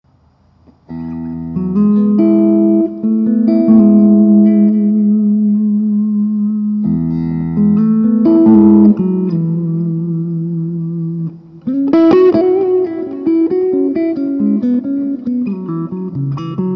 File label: middle position clean, guitars